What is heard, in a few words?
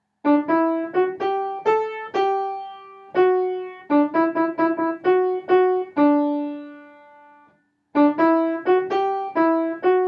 melody